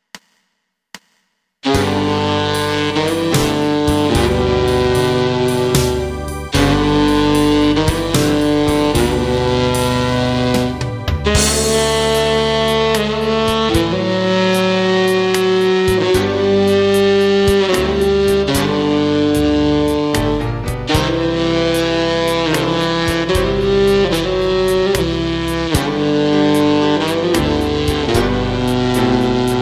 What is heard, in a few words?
Silent Night Tenor Sub